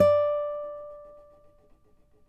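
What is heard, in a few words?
nylon, FLAMENCO, Guitars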